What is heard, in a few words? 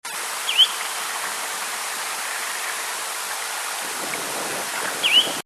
Tringa erythropus